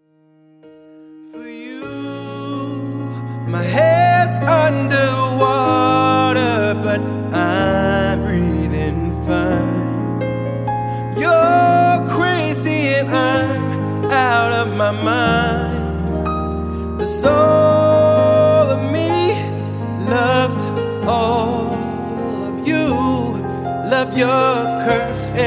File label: solo piano with fade